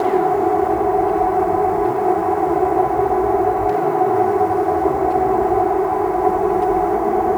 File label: ATMOSPHERES 130 F; modern techno; teknovault modern techno production suite